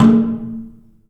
DRUM; The Piano; InVision Interactive

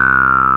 SYN Filter; Best Service Gigapack 1

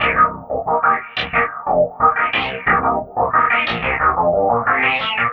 Clav Comp, Funk Keys; Sonic Foundry (Sony Creative Software); Synchro Funk

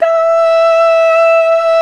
VOX Male Scats; 90s Sample CDs; Club-50 - Foundations Roland

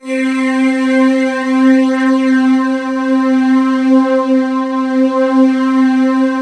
AIR STRG; MORPHEUS

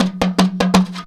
JUNKU RIFFS; Best Service; World Colours

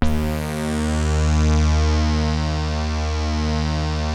KORG D#2; Hollywood Edge - Giorgio Moroder Rare Synthesizer Collection

